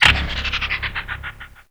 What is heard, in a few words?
slowed; wesnoth